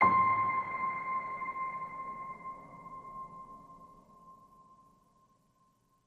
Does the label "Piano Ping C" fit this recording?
Yes